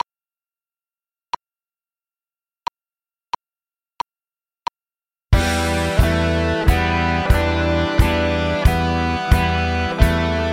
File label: GTR Drums